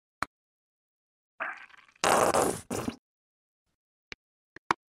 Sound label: sauce bottle pouring sound; kitchen